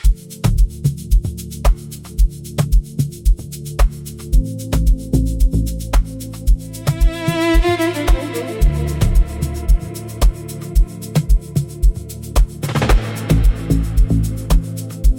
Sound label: Egyptian Pharaoh Background Noise